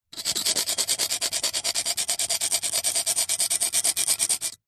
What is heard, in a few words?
foley stone or flat rock knife blade scrapes scratches fast; zapsplat